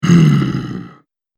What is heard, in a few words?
Orc Thinking; horror